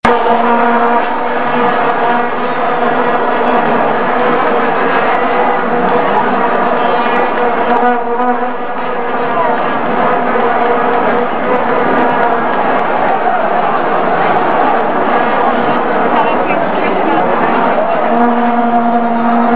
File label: Vuvuzela